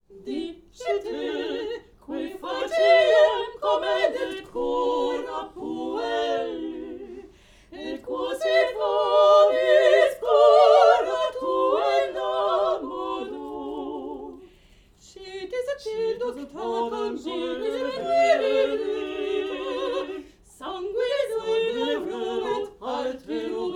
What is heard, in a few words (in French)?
chant